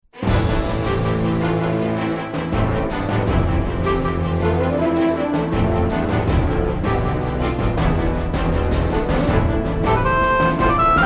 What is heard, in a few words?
battle; 戦闘音楽D; 哀愁・宿命